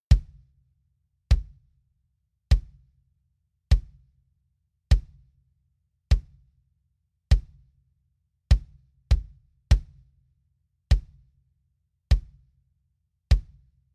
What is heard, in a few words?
kick bloom